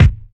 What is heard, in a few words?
2000s clean kick single shot f key; kick drum samples